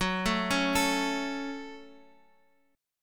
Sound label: Gb Minor, Guitar; Gbm arpeggiated; 14,12,11,14,x,x; 4E,4A,5D,5G,5C,6F